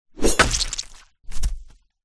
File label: warfare sword swipe hit body impact hard squelch head or limb fall to ground